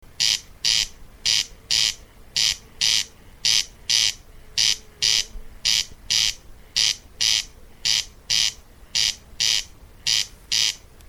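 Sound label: corncrake voice; korostel golos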